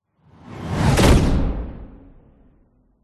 science fiction impact bullet hit incoming whoosh thud